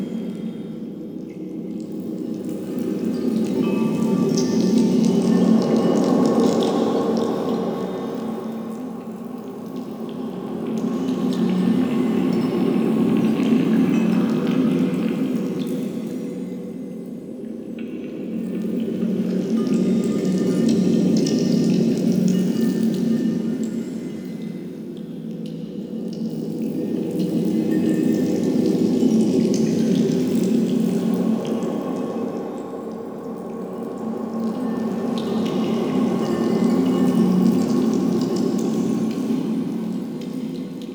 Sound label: Small Bed Amb, Colony; Warframe; Ambience; Nokko Colony